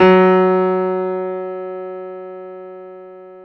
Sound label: PIA MICRO, MICRO-PIANO